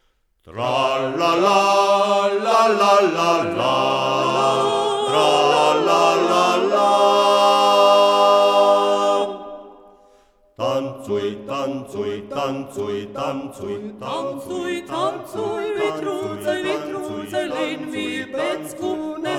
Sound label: acappella; Tancuj